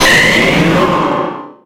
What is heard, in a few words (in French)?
Cri Méga; XY